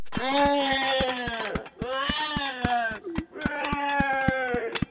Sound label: defeat loop; Boss; HighGroundRoyaleNetcode